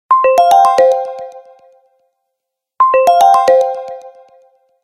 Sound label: Alarm, Nokia Lumia 1520; Lumia 1520 South Africa